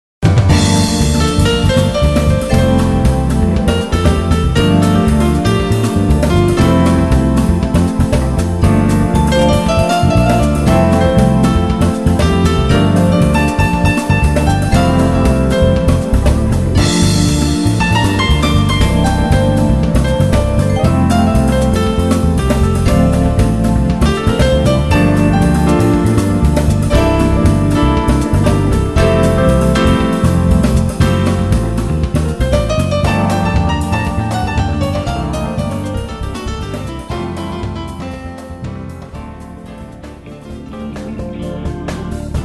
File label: Future Jazz Piano